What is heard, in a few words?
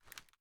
inspect; gmod